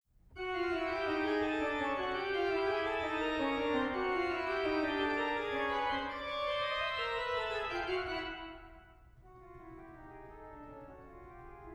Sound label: Organ